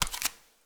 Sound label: Footsteps Barefoot One